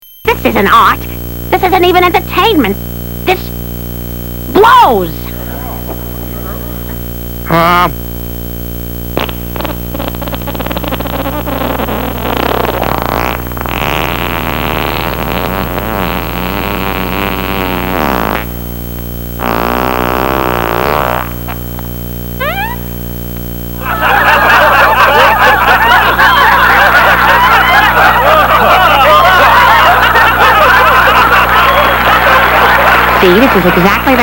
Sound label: fart